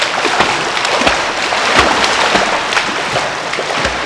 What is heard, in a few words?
splash